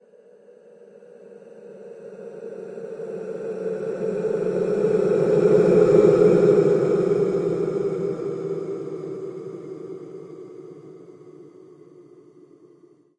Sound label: spirit voice; Atmospherics